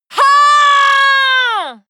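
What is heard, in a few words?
female combat attack long, screams; schreie; menschen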